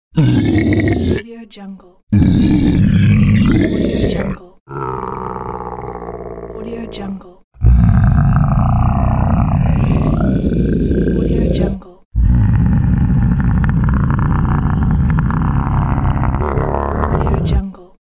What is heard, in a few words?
monster growling